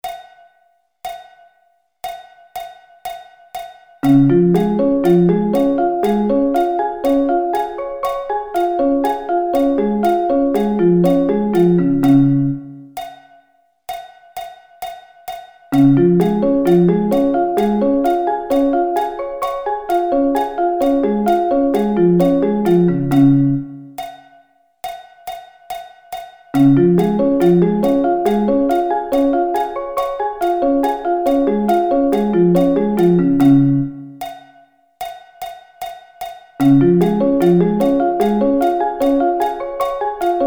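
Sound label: D flat Arpeggio Inversions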